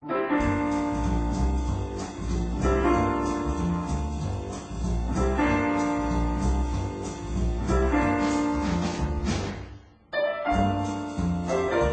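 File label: Music Ambient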